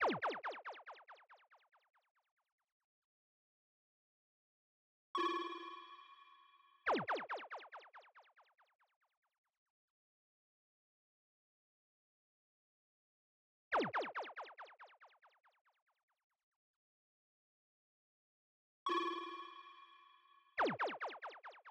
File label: Speaker - 140 BPM D# Min Dub FX, UK Garage; Melodies; Loop Stems; Cymatics; GHOST (Beta Pack)